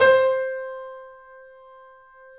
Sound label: The Piano; InVision Interactive - The Piano